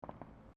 Fireworks Multiple Crackles

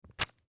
Zip Lock Bag Drop; Household; Plastic; Materials; Blastwave

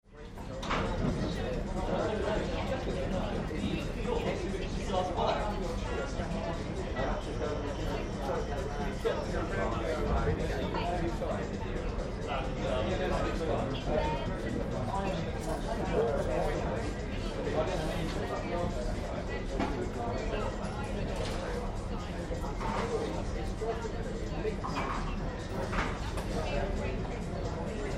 Saloon Bar Crowd on Ferry, Cross channel ferry; Cross channel ferry - till cashing - foreign chatter - background engines; Bars And Club; Tom Hutchings

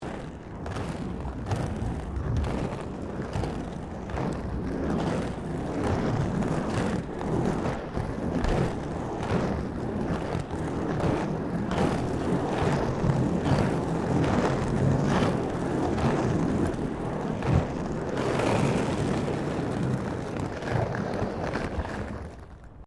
ROLLERBLADING PAVING ROUGH SEQUENCE

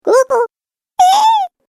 Cartoon Game Voice Baby Sounds; Cartoon Voice Sound Effects

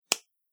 Single Hand Clap; Body Sound Effects; Human Body

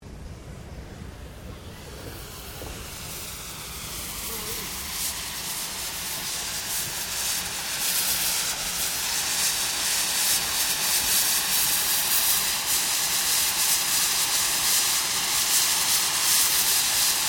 Steamtrain Venting; Bellarine Tourist railway vehicles; Trains

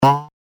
Cartoon Boing

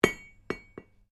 Anvil Impact Double, Small anvil impacts; Metal; Materials

